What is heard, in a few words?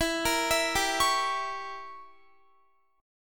E Diminished 7th E, Guitar; Edim7; arpeggiated